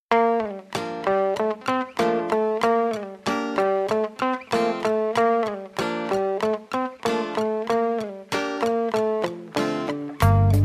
Beautiful Solo

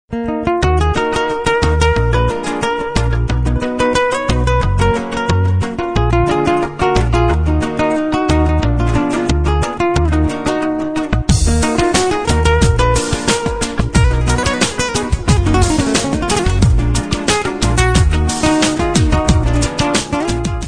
Guitar solo

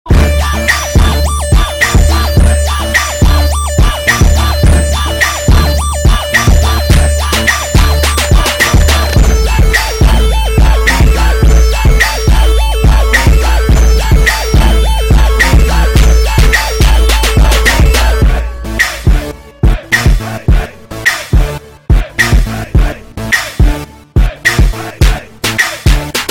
Hard Bass

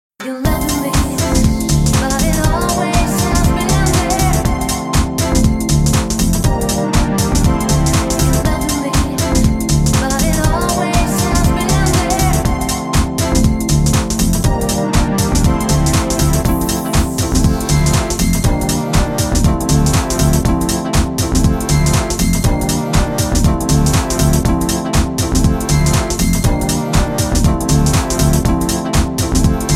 Mall Music

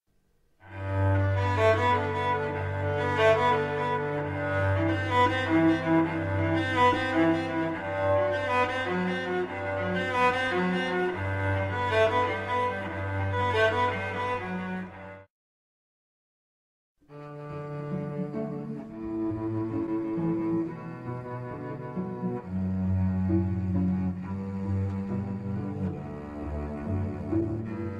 Canon In D

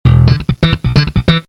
Bass Slap Guitar

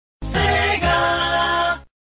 Sega Startup

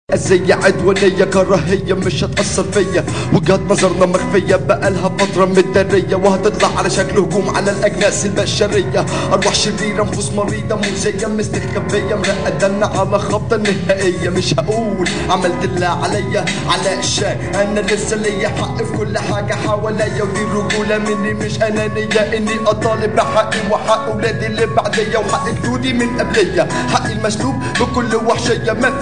Egyptian Rap

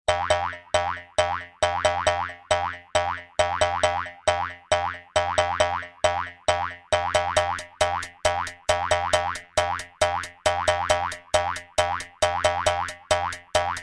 Boing

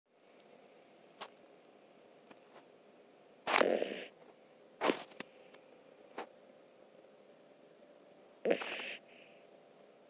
Funny snoring puppy

